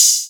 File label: OPEN HAT